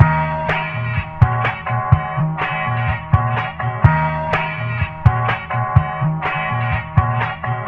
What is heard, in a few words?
guitar, Phonky Loops